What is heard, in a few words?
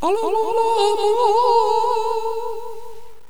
minarett; megaglest